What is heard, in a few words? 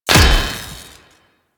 spear throw impact; Warframe; Weapons; Corpus; Spear Gun